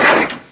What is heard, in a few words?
door, doors; svencoop; quake1